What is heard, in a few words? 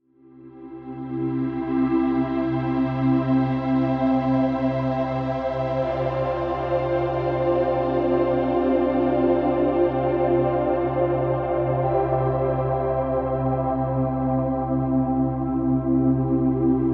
Deep Relaxing